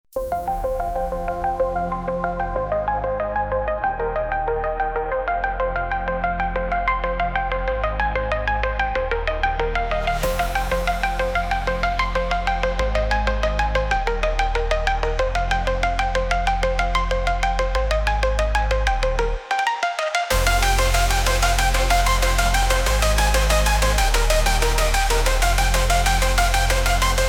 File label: Electric Pulse